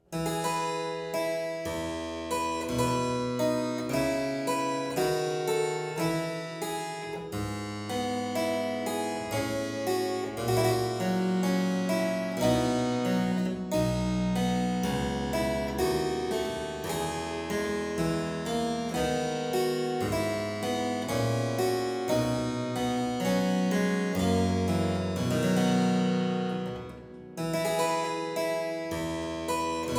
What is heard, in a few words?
Suite in F major Sarabande, Harpsichord; Buxtehude; Complete Harpsichord Music; BuxWV238